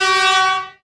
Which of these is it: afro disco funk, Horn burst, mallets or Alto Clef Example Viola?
Horn burst